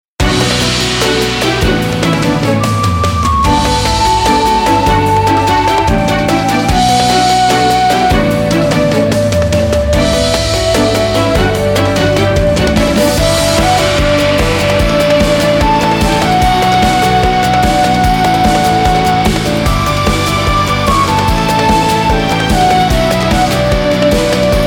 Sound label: bgm